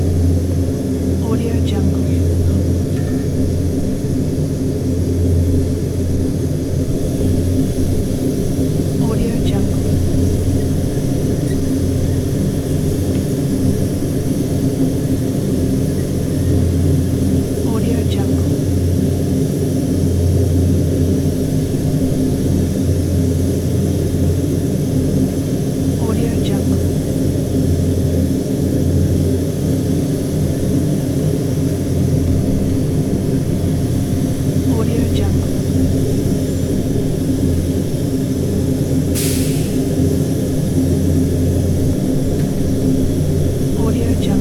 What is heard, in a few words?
asphalt kettle operating